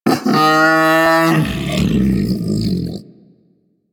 Predasite Vocal Death; Warframe; Hunting Critters

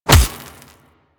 Melee Fist Impact Robotic Flesh

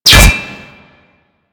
Bolt Impact Metal; Warframe; Weapons; Cross Bolt

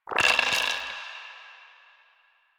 Dice Roll; Warframe; Koumei

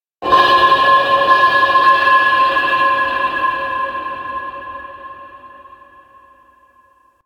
Hub Metal One Off; Steel Meridian; Warframe; Ambience; Tenno

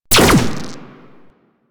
Drone Laser Fire; Super Moa Drone

